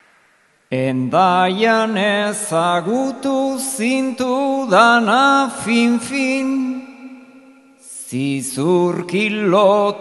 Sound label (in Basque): bukaerako agurra, bertsoa; zizurkil